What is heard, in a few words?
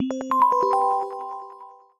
Ecoin, notifications; Honor Pad X7